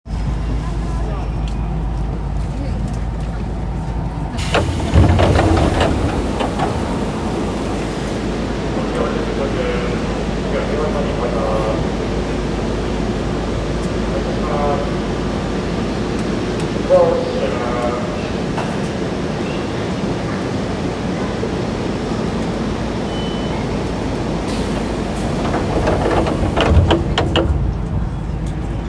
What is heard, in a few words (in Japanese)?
本線青物横丁南, train sounds; 京急